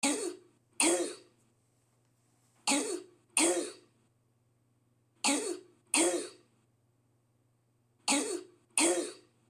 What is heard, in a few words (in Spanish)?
Laringitis; la tos suele ser ronca o "de perro"; Síndromes respiratorios; Tonalidad y timbre